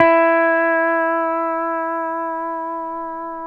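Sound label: pedal steel E4 right; PEDSTL NOTES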